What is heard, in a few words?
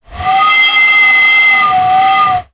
sound design effect air pipe pressure